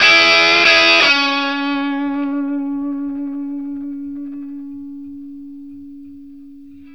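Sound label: BLUESY C sharp 60, Vintage Blues Guitar; AKAI; USB Soundscan vol.22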